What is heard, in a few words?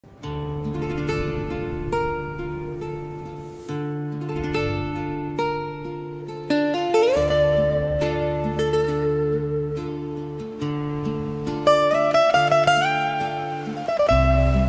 Dark Cinematic Fado Rain Ambience